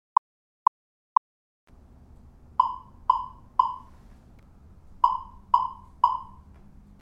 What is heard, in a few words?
1k burst; orion pluto